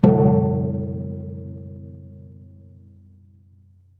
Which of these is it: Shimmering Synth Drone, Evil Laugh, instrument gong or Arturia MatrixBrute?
instrument gong